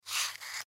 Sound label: Match Box Open, Fire Box Slide Open; Flames; Objects; Flame Sound Effects